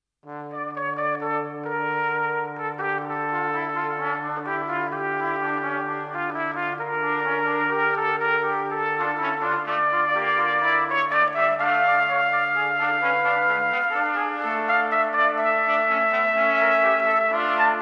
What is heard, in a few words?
Praeludium u feghetta d-moll